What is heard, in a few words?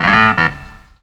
CLAVINET; Ragga & Dub Reggae